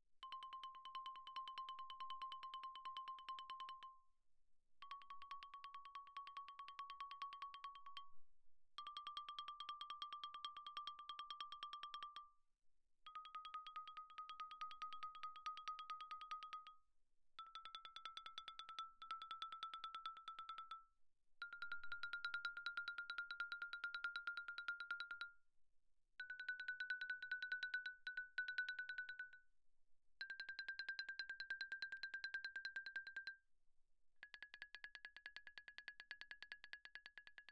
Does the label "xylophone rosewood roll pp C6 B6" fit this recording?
Yes